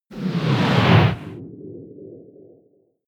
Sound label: giant wing wind